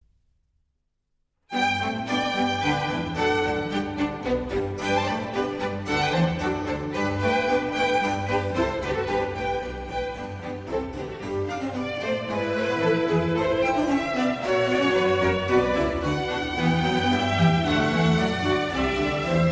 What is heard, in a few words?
Bassoon Concerto in G major, Allegro; Vivaldi